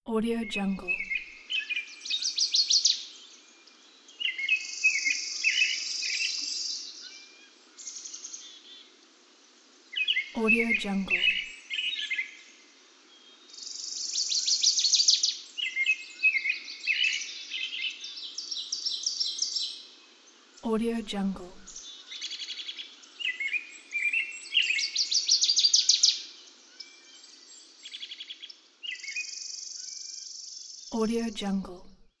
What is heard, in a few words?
birds